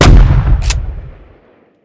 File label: glauncher fire, weapons